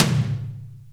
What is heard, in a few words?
TOM